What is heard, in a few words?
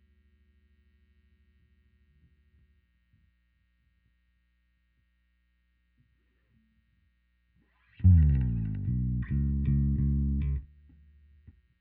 new Bass